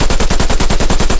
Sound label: loop rps10 besa origin, guns tanks